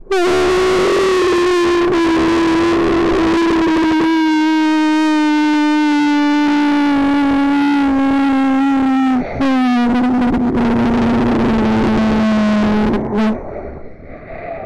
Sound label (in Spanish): mi grito